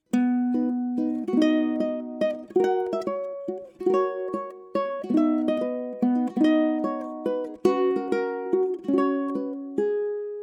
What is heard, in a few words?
Ukulele; Rigoletto